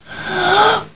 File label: pant; Battle of the Superstars